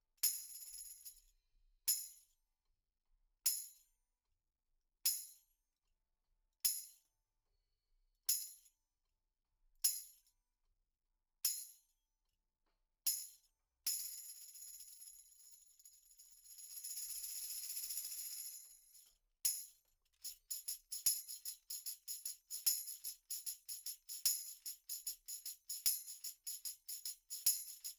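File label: tambourine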